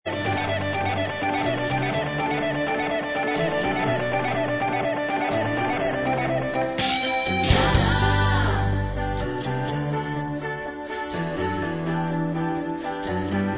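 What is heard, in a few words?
backing vocals track, backing tracks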